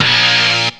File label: rock the bellz, Stabs